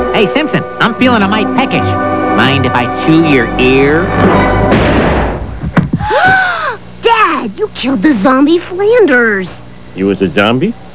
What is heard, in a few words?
zombie